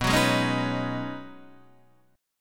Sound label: B 6th Add 9th B Guitar DADGAD, chord